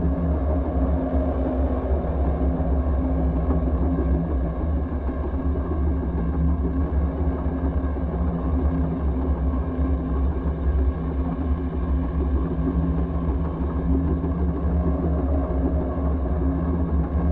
ship slaver idle; pre-FTL; Stellaris; first contact

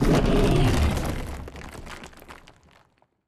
cutholoids hide; Stellaris; grand archive; ships

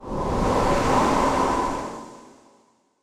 desert planet; ambient; Stellaris

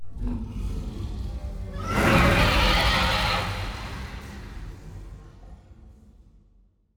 aquatics dragon death

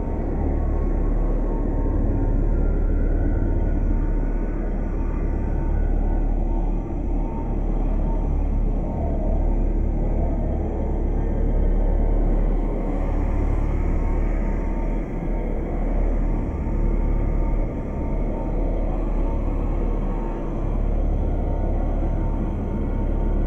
ship habitat idle; Stellaris; shroud; ships

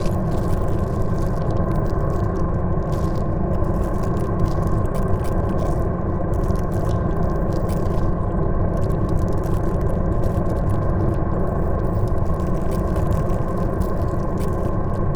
pro ship mauler idle; biogenesis

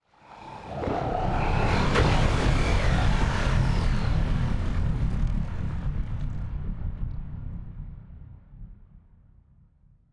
ship transport ship move; Stellaris; machineage

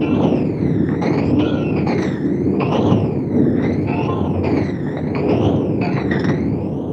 ambient mining station signals; Stellaris

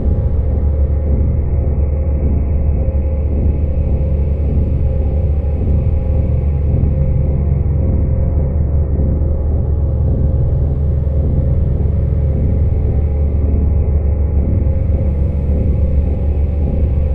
amb gatebuilder mothership; Stellaris; distant stars

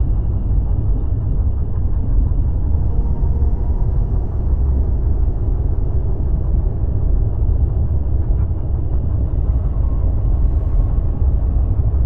nemesis battleship hum; Stellaris

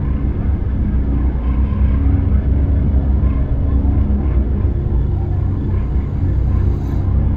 dreadnaught idle; Stellaris; guardians

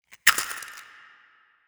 small legs up down; biogenesis

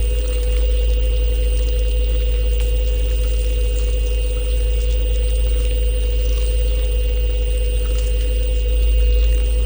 ant ship harbinger idle; biogenesis

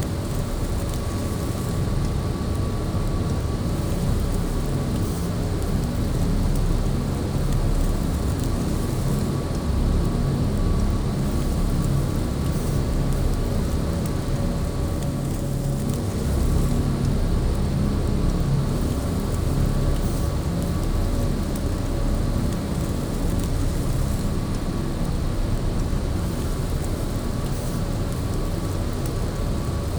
ship destroyer idle; ships; Stellaris; toxoids

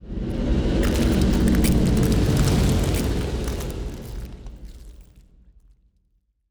ship titan move; Stellaris; biogenesis

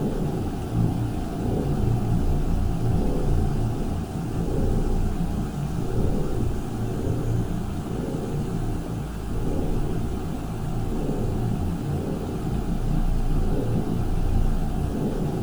ship transport ship idle; Stellaris; machineage

